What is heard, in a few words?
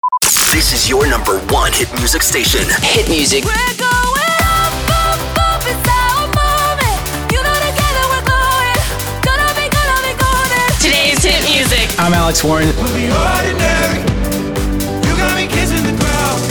sweeper beatmix